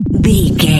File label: retro misc bass sound